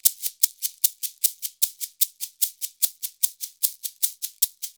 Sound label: Modern Percussion Loops; Soundscan vol.56; AKAI